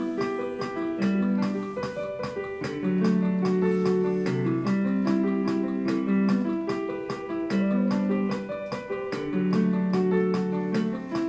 recording her piano

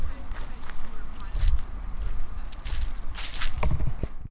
walking in leaves

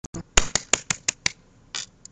clapping

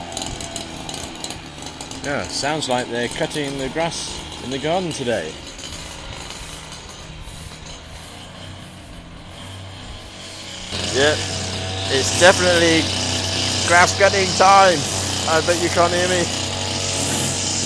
grass cutting

